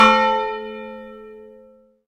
cloch sol

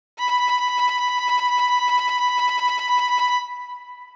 violon trem

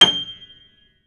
piano aigu

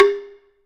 bambou attq gr

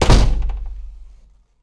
porte claquement

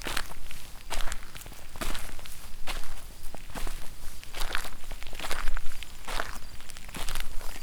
pas feuilles

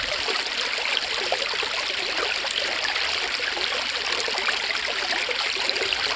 ruisseau

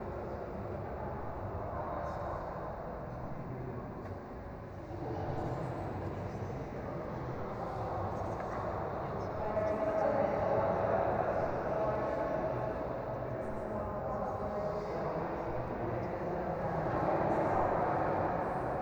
eglise et ses voix

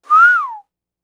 sifflet

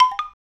bambou mel

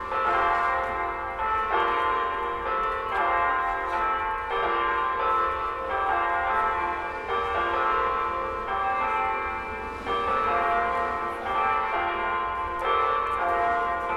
eglise

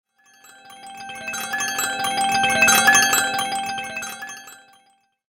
carillon

